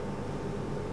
Fan Loop, Train; Cl323; OpenBVE

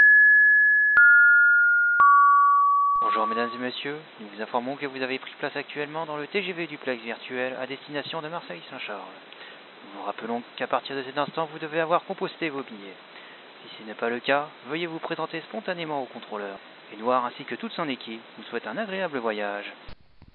klaxon, Train; OpenBVE

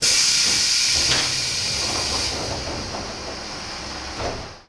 Door Open, Train; OpenBVE

